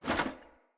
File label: Foley Action Armour Melee Swipe; Deora Armour